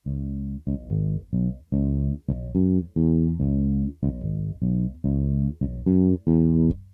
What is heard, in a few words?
bass